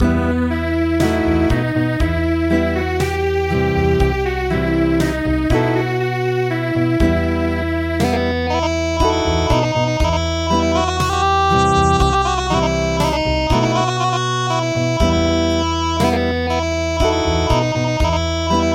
Acoustic

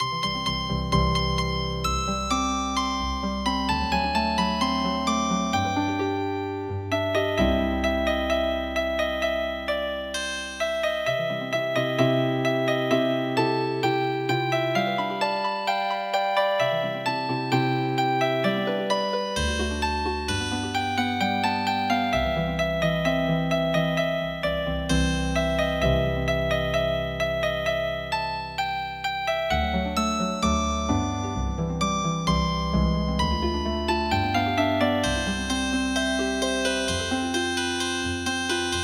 Marsch Healing Harp; midi; traditional

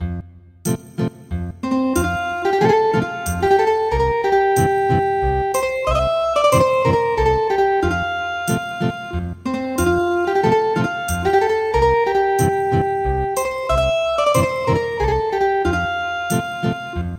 Ethnic Guitar

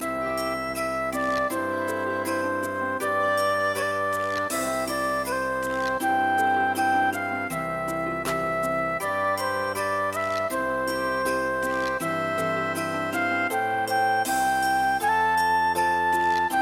child, midi; Musunde

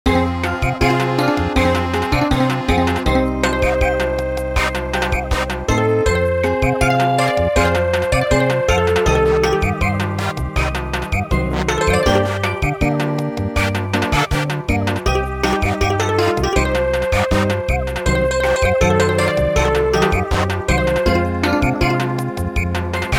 Samba, midi traditional

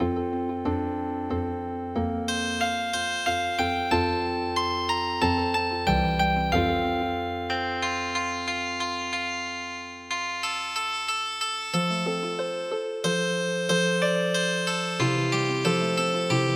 Healing Harp, traditional; The Farmer In The Dell

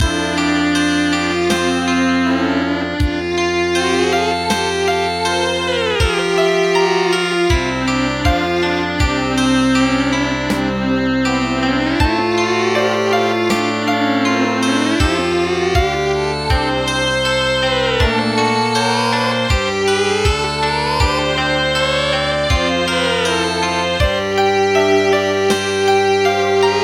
children

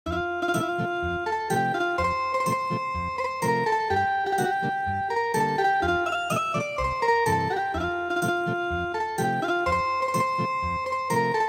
Ethnic Guitar

